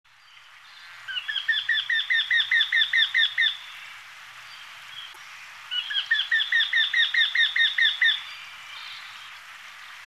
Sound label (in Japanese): arisui, birds